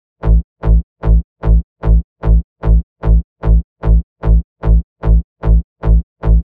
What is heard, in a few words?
Reverse Bass Loop A (150 BPM)